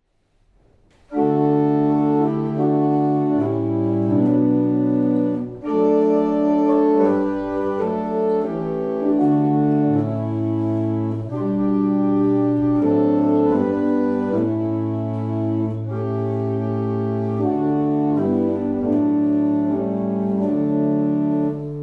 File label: Double chant, barrel organ; Steeple; Mornington